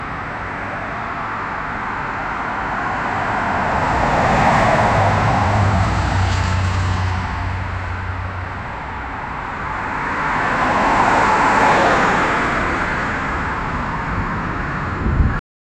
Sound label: the sound of car noise, city life